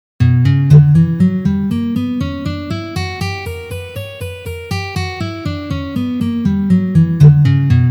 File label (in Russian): Gamma Si minor garmonicheskij vid pozitsiya